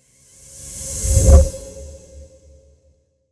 Magic spell whoosh

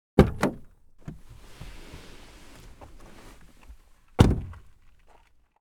Car interior perspective door opening and closing

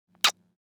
Sexy woman short kiss

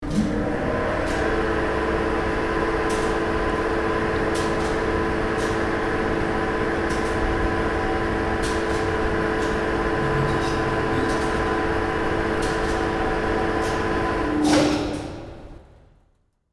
Ship winch cranking releasing

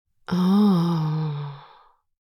Adult female pleasure moan

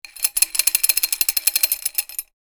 Chain pulling through mechanism

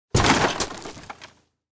Scooter falling over clatter and crash

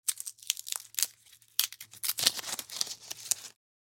Hand peeling fruit; cooking

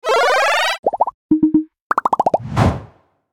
Infographic graph build up pops whooshes

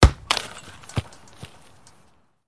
Soccer ball kick into net penalty

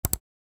Mouse click

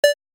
Shopping store checkout scanner single beep; shopping sound effects

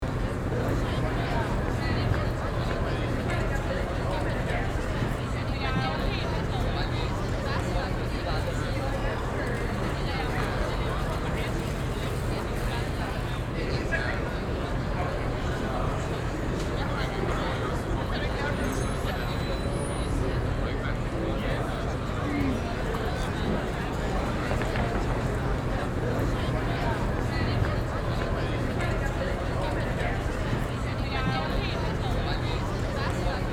Indoor station cafe atmosphere voices walla loop; restaurant; gfxsounds

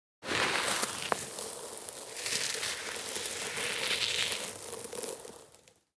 Pouring sand on ground slowly

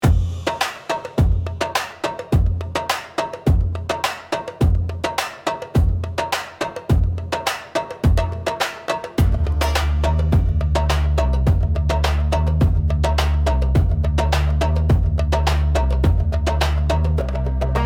Middle eastern beat desert mirage

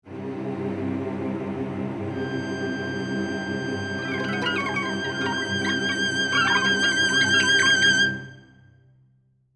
Suspense violin strings eerie dark tense horror

